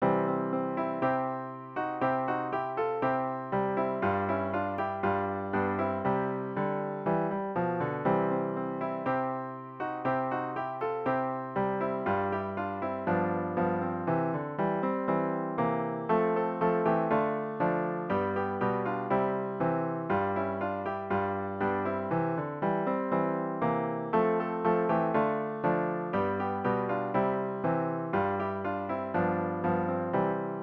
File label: midi